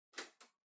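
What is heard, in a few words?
Portable Stereo Far Tape Fast forward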